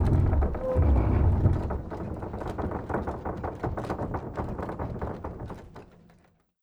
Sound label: door large wood open start; Rust